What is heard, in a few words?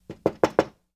Knocks On Glass Light